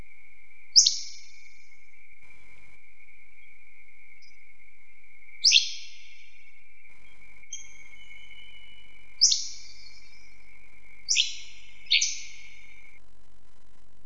Hammond's flycatcher, calls

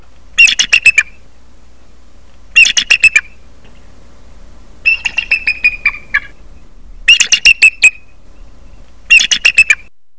bald eagle

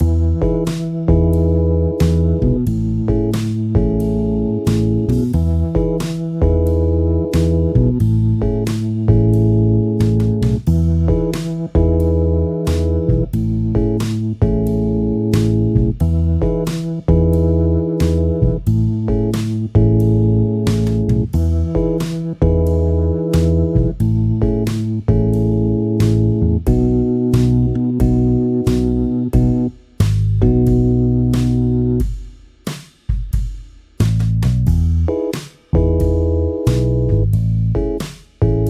Backing

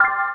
correct

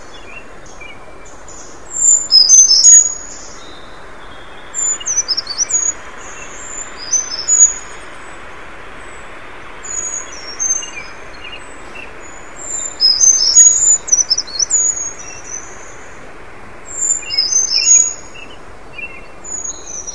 brown creeper

